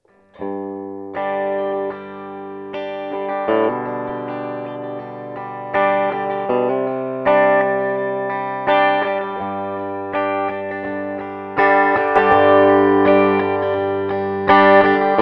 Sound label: BOOSTER, guitar